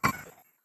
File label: ringer vibrate